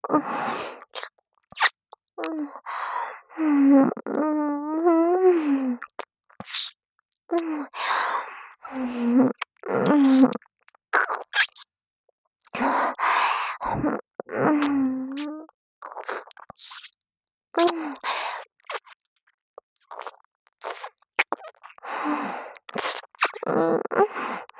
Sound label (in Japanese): 先輩保健委員の左耳舐め; SEあり